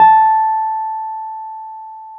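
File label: piano Wurly; PNO; Roland LCDP10 Keys of the 60s and 70s 2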